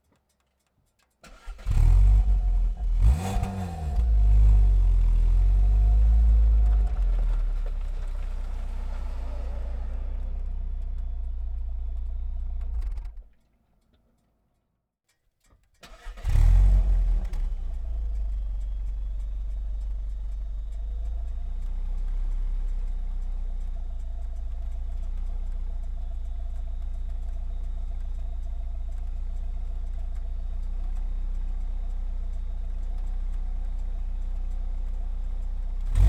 Skoda Octavia 1.6 onboard start stop idle blip steadies Exhaust Catalysator; 2008